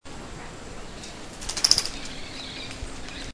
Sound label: Banded cotinga; پرنده گوهرمرغ نواردار